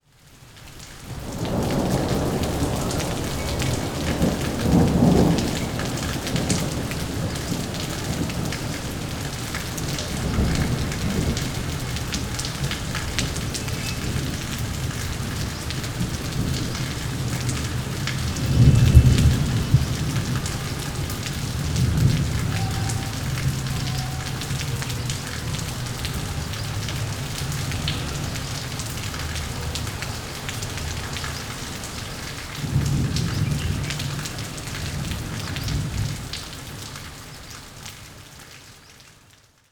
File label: Rain and Thunderstorm at Lallgarh Palace Bikaner; Samuel Corwin; Selected Field Recordings from India and Nepal Volume III Environments